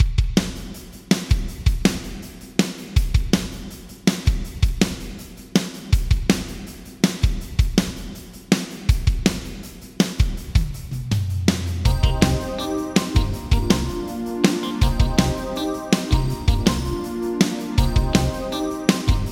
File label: Drums Yamaha ProR3 Vintage Digital; Droid Long